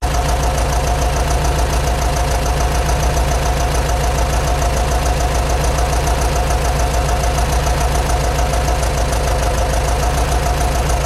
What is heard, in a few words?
Airbound Lifters, Engine Sounds